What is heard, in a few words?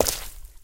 corpse step; Golden Crayon Sounds